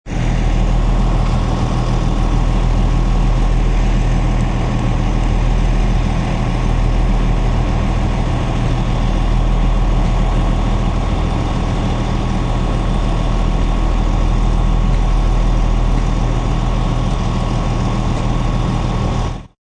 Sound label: Truck Idle, Large truck idling.; Trucks; Vehicles